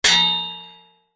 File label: sword strike metal could be shield; zapsplat